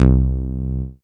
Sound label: Acid Bass, Dance Synths